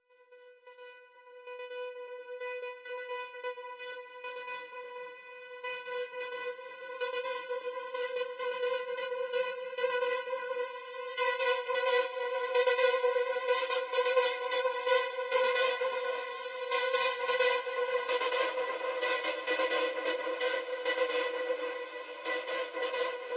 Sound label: Action Drone clusters